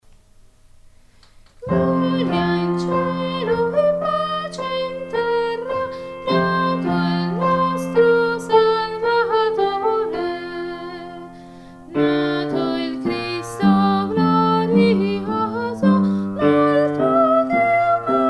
Gloria In Cielo Pace In Terra Soprani

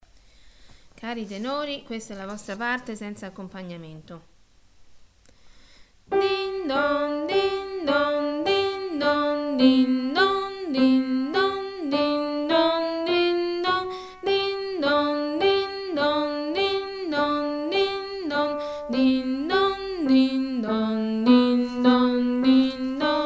jingle tenori

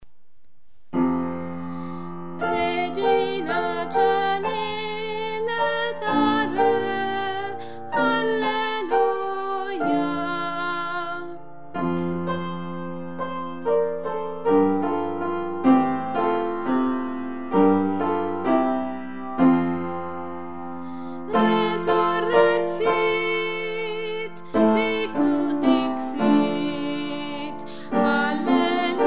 regina coeli tenori

